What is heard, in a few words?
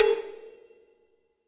Cowbell Big Low Loud Squashed; Drum Hits; Ableton; Factory Packs; Session Drums Club; Standard Hits